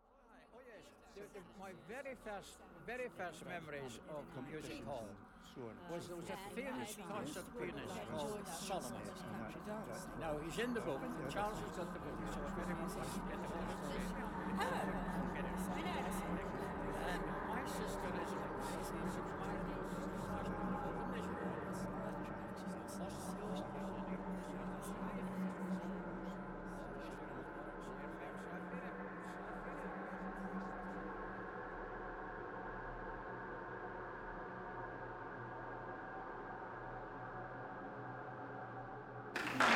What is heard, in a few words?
Ambience, Music Hall; Aberdeen Concert Hall; Reverb from The Aberdeen Concert Hall